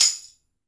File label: TAMB